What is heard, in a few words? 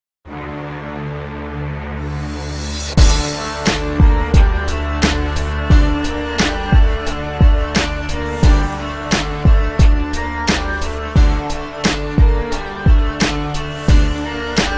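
Destroyed Violin Beat Dark Rap Instrumental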